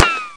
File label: iceblock bump